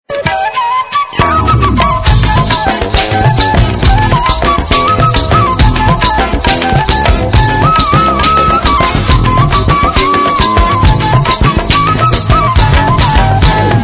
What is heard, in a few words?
flute song ringtone